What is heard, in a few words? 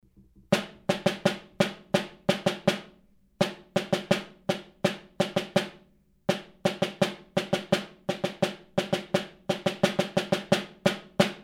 snare